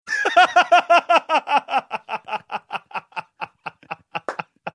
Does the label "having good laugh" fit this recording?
Yes